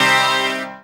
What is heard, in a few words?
dance chord